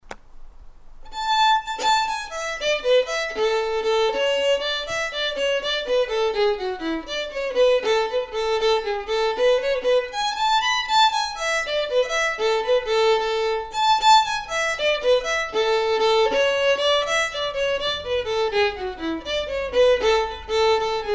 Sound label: Jig A, fiddle; Coolea Jig